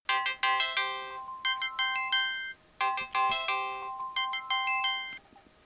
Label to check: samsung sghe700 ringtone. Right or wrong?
Right